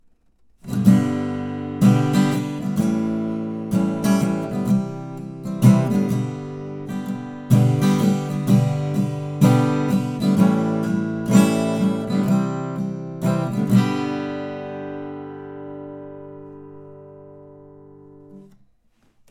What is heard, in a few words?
Martin DJr 10E Strumming